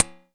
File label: DRM HAT Ringer; Polyend; Plughugger